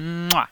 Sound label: kiss; garrysmod